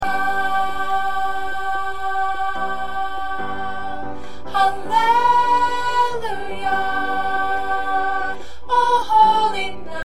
Soprano

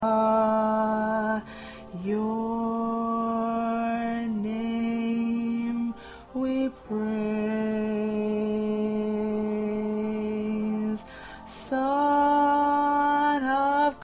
Lead

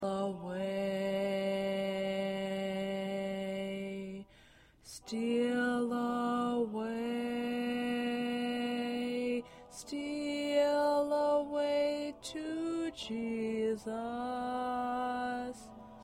High Voice

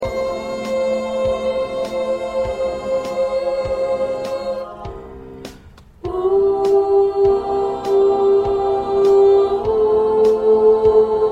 1st Soprano, choir; christmas songs for choir; part preview; PRACTICE TRACKS

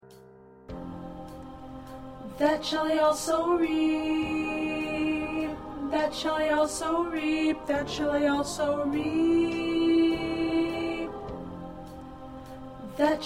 1st Alto

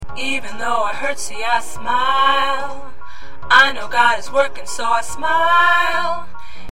Tenor, choir

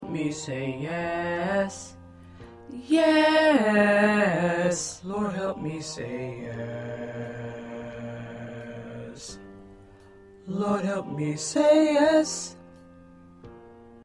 2nd Tenor

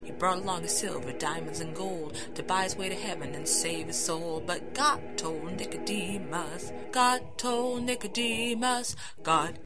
2nd Tenor

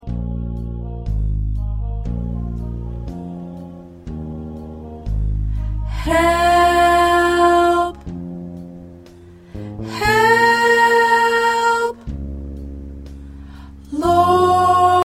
Alto, choir parts

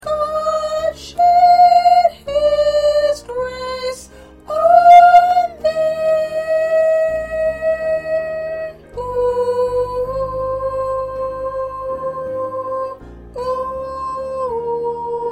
2nd Soprano

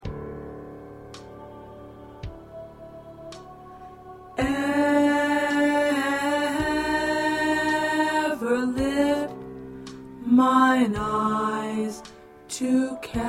Tenor

